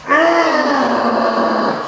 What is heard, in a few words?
melee; saitama